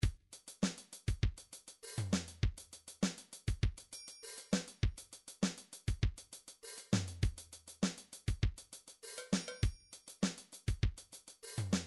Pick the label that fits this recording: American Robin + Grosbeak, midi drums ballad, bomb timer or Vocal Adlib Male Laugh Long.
midi drums ballad